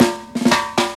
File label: 120 fills; Sound & Vision - Gigapack I